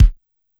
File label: Bass Drum; Yamaha PSR-E323 Standard Kit; drum machine